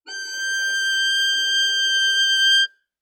Violin arco ff sul E G6; MIS Pitches - 2014; Strings

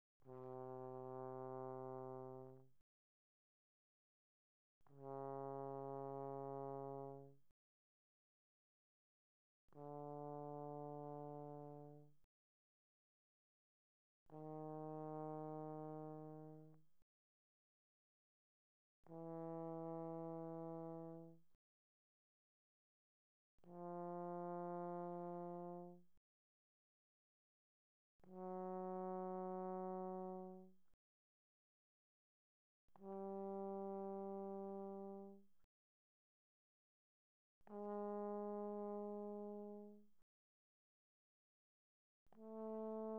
Tenor Trombone, pp, C3 B3; Brass

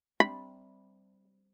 Viola pizz ff sul A B5; MIS Pitches - 2014; Strings

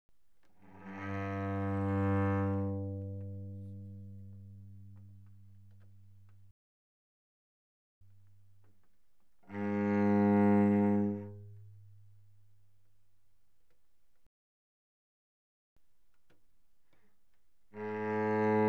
Cello arco pp sul G G2Bb2; Strings

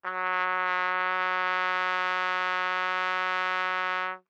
Trumpet novib ff Gb3 stereo, Bb Trumpet; MIS Pitches - 2014; Brass

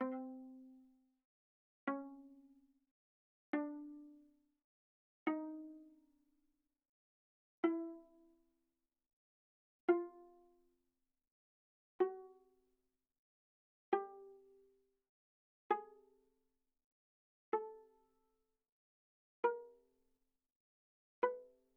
Viola pizz sul G ff C4 B4

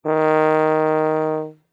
Tuba ff Eb3; Brass

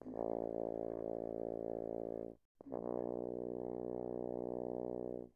Horn, pp, Bb1 B1, french horn; Brass

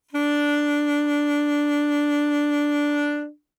Alto Sax vib ff D4, Eb Alto Saxophone; Woodwinds; MIS Pitches - 2014

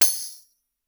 tambourine2 normal ff stereo, Tambourines; MIS Pitches - 2014; Percussion

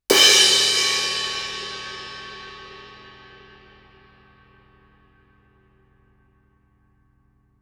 crash stick shoulder ff stereo, Suspended Cymbals; Percussion

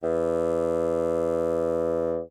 Bassoon ff E2; Woodwinds

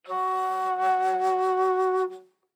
Bass Flute ff Gb4; Woodwinds

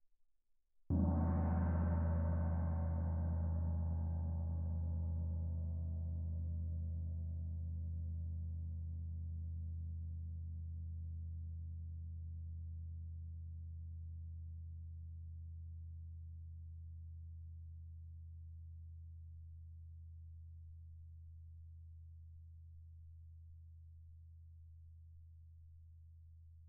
tamtam mf, Percussion; gongs & tamtams

